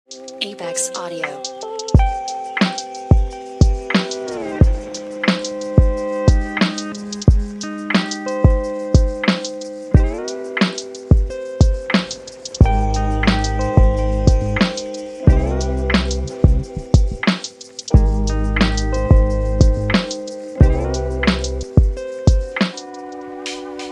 Emotional Lofi; Apex Audio; Summertime Strain

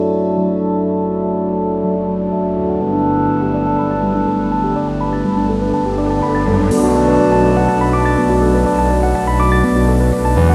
Melodic Techno; ambience; Rezonance

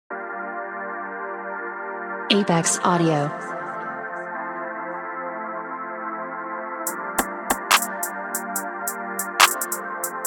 Modern UK Pop; Apex Audio

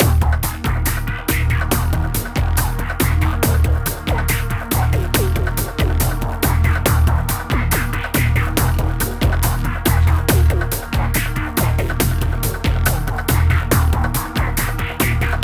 Foley Serum Presets; downtempo chill; Black Octopus Sound; Imaginate

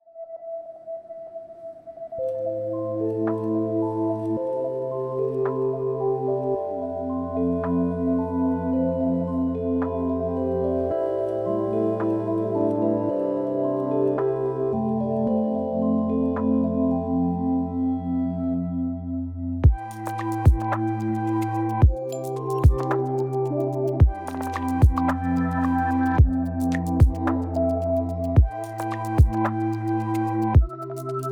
Midnight Chill; chill presets for serum 2; Black Octopus Sound; Tim Schaufert; bloom

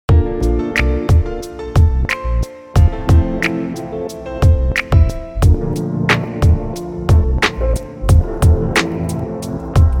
Grain Reverse Lo-fi Keys; Samples Choice

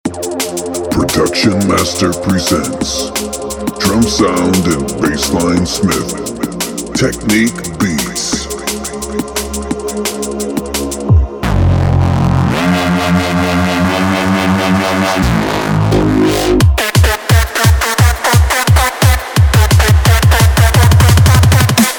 BEATS; organic keys

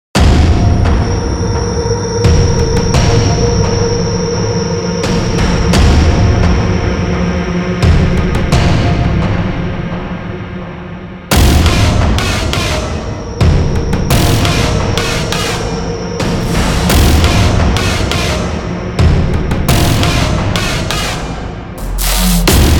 Heavy Neuro Drum and Bass; Black Octopus Sound; The Resistance

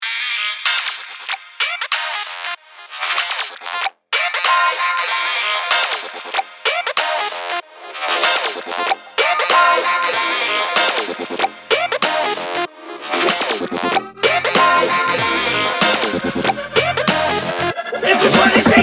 glitch hop; Black Octopus Sound; Westwood Sounds Vol 4; SugarBeats

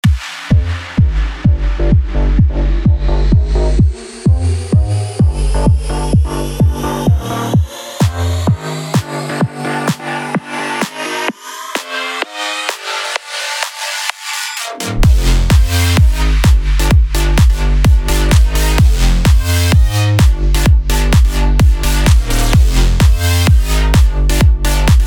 Future House; big room; Forbidden

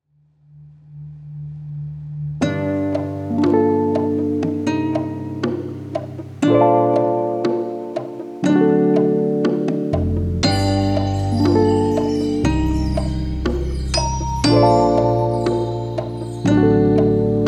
Reel to Reel Vintage Lofi, hip hop; Black Octopus Sound

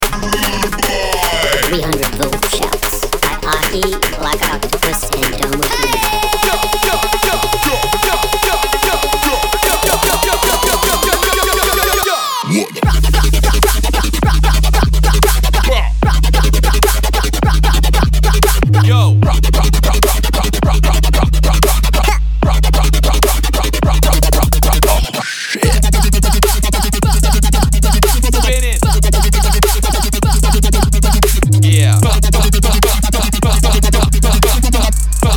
Vocal Shouts; siren; Black Octopus Sound; 300 Vocal Shouts

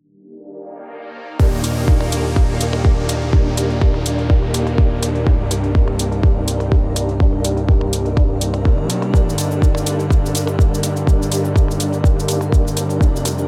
eighties synthwave; Black Octopus Sound; Playhouse Vol 1; Callum Cant Sleep; electric escape

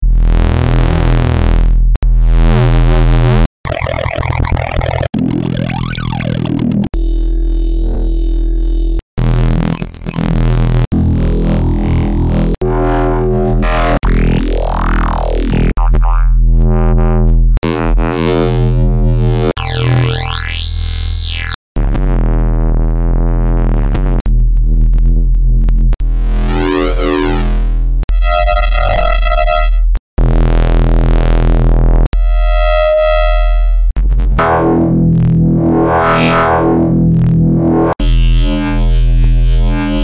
Serum Wavetables; Psymbionic; MacroSurfing

